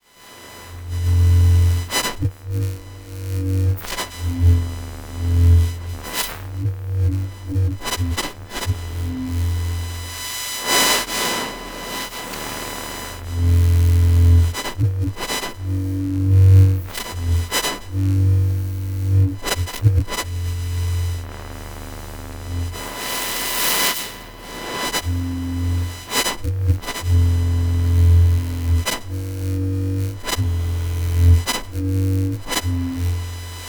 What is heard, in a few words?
Not A Light Saber